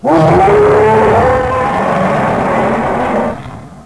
roar

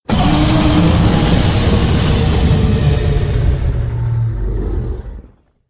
gar alert; sven; npcs; cthulhu

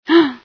startle, female

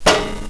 player ladder

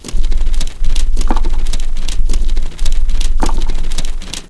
elev move

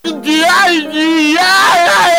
hurr

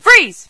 attack; sven; npcs

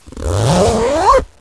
zipper

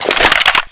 draw; weapons; hecate2

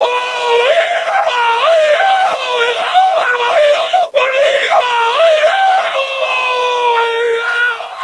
scream; chatsounds; homer

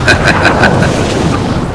heh

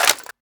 mag out, ak74

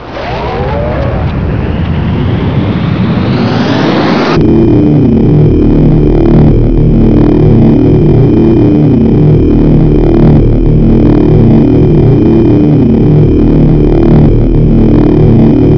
mission complete alt

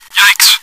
run

